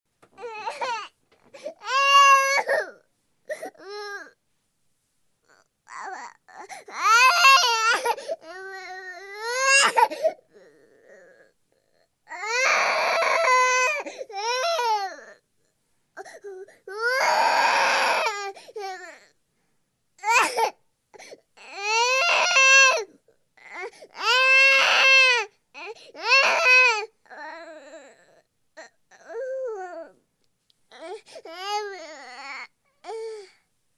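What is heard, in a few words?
baby crying for a long time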